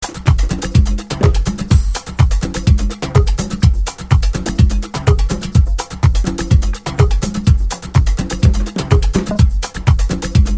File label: conga